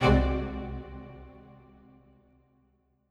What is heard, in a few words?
Strings Hit Spiccato